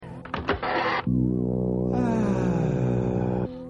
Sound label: aaaah